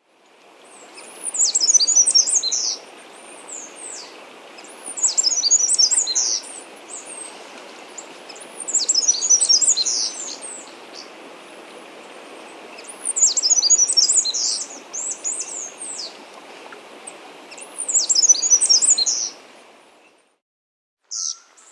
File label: Mountain Wren (Troglodytes Solstitia; S. America